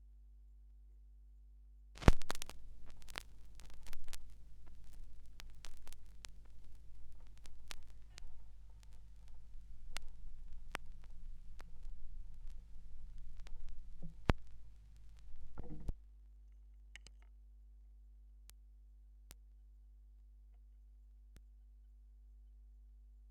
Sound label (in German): schallplattengeraeusche; rauschpartikel